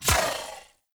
Dodge Impact; Warframe; Pacifist

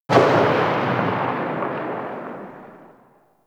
Grenade Launcher Tail; Grineer; Weapons

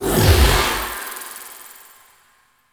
Moon Bridge; Ambience; Gameplay; Warframe; Orokin Moon

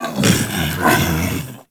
Tame Sleep Idle Snore Fidget; Warframe; Kubrow

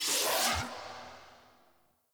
Radial Blast Sword Cast; Excalibur

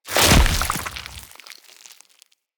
Root Spider Elite Web Shot Proj Burst; Animals; Spiders; Abilities

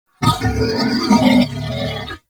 Horse Vocal Screech; Vehicles; Warframe; Duviri

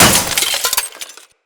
Container Open; Control Module; StorageContainer; Material Specific Loot Containers

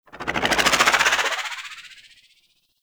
Blink Slam Spin Out; Warframe; Infested Lich

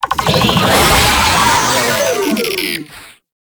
Attack, Vocals; Warframe; Proto Infested; Babau

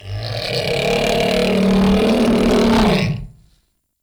Ancient Tank Death, Enemies, Infested, Barks; Warframe

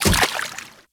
Kubrow Step Water, Footsteps; Enemies; Warframe

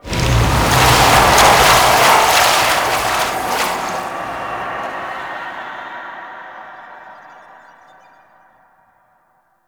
Hex Melt; Warframe; NineteenNinetyNine; Stage11FuturePast

